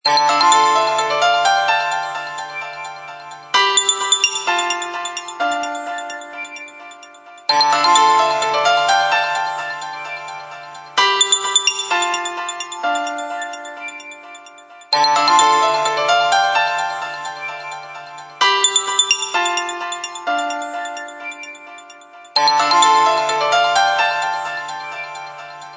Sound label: Innovation, Ringtone; HTC Freestyle